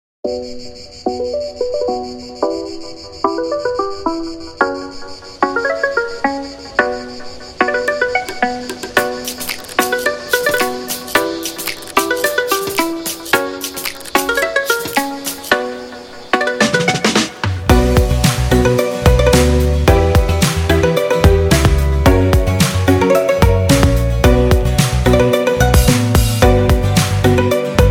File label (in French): Identité Sonore Musique; ENJOY SUSHI